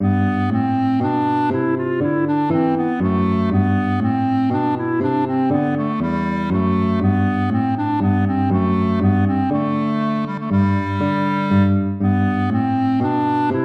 baroque minuet major

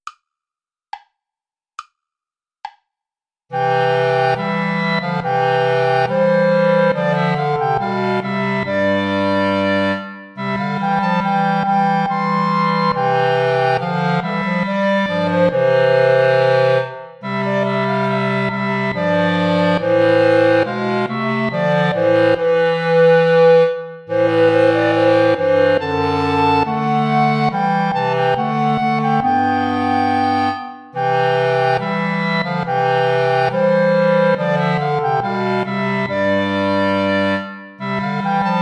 play along without clarinet; haendel georg friedrich; zion daughter rejoice

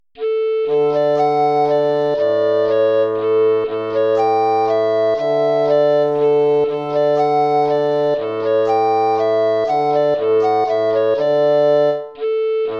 waltz; Carulli Ferdinando

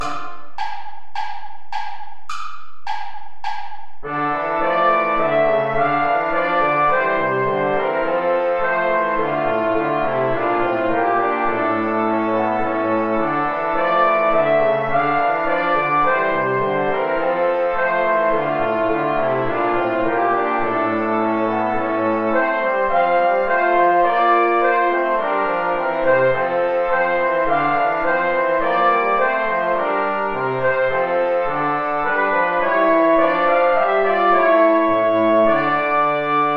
play along without trumpet

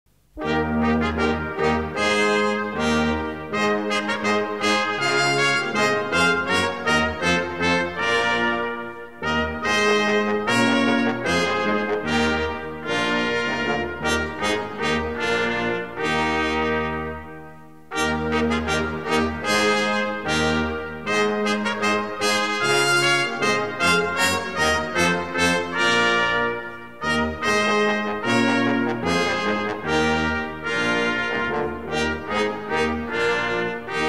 organ transcription; Schein, Johann Hermann; intrada; venus kranzlein

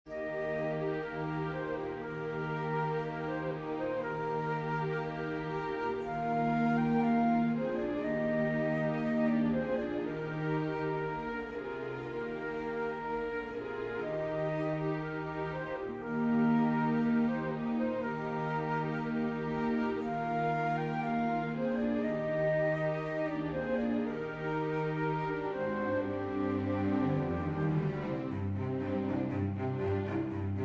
song for flute strings

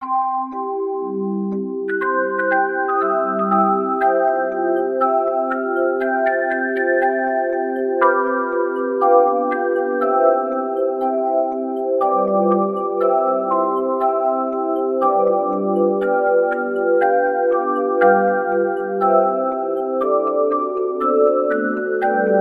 belleplates

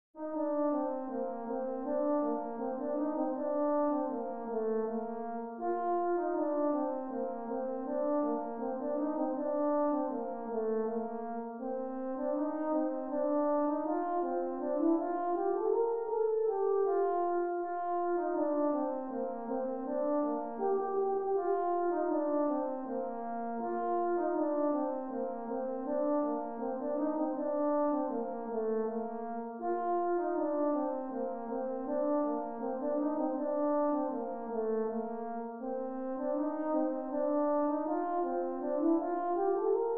deck the hall

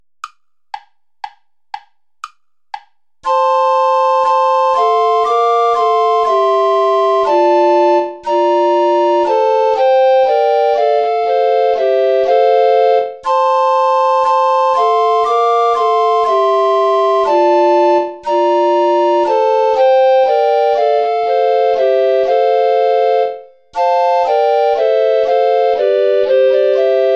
play along without guitar; praetorius michael how rose blooming

